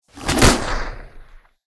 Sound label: explosion